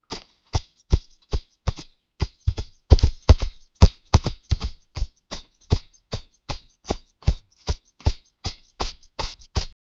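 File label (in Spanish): sonido de pasos de vaquero, western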